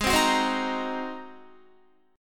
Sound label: G sharp 9th G sharp Guitar; G#9; 15,15,18,17,15,18; strummed